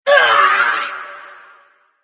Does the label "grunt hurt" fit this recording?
Yes